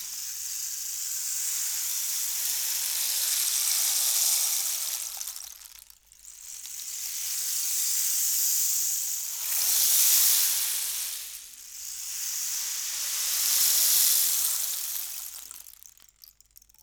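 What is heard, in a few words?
rain stick; Univers Sons - Raricussions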